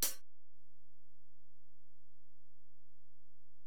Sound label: HiHat, Alesis DM-PRO